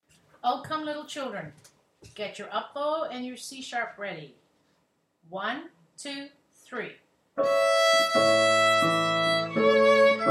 o come little children, Strings Practice Recordings